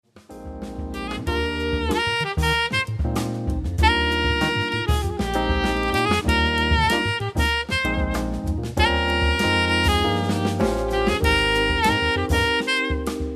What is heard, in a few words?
sax lounge